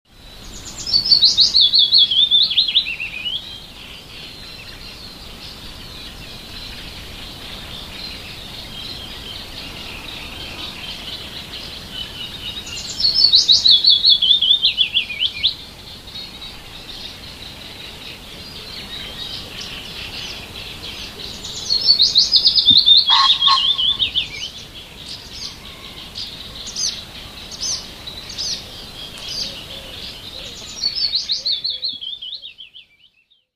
piecuszek, śpiew piecuszka

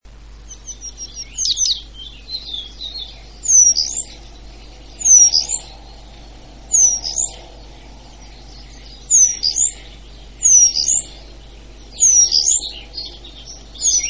remiz - dłuższy występ; wroblowe

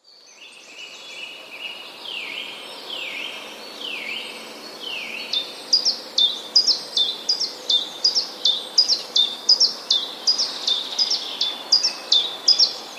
pierwiosnek, ciekawy śpiew pierwiosnka; Śpiew jest interesujący dlatego, że w piosenkach słychać nie dw

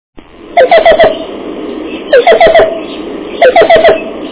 kukawik czarnodzioby

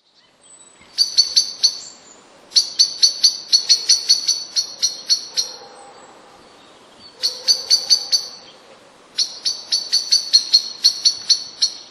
dzwonienie, dzwoniący głos prawdziwego kosa; wroblowe; zapozyczenia

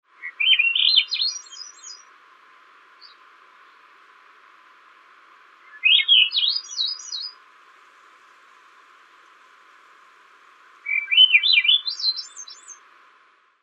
drozdek okularowy - Catharus ustulatus; ptak dotychczas w Polsce nieobserwowany; wroblowe; turdidae

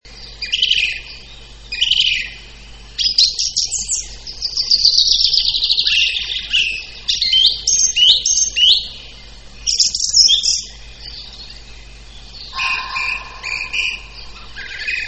drozd śpiewak z innymi ptakami; wroblowe; turdidae; turdus

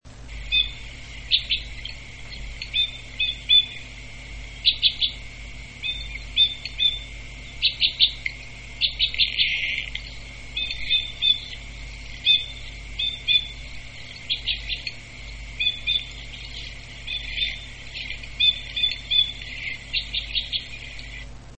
krzyżodziób modrzewiowy

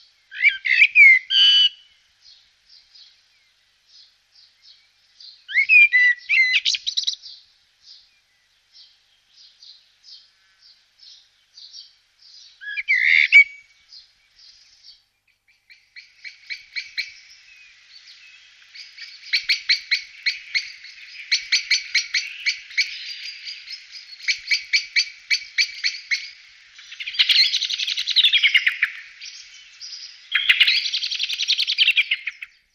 kos - śpiew i nawoływanie; wroblowe; turdidae; turdus

